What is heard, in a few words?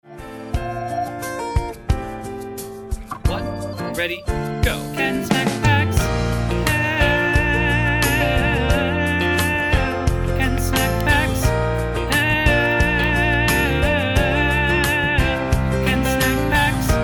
alto tenor guide